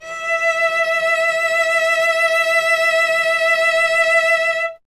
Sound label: STR cello E, Cello; Miroslav Vitous; Solo Instruments VOL-1